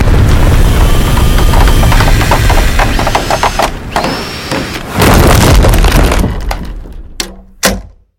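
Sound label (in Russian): чудовищные разрушительные взрывы с криками жертв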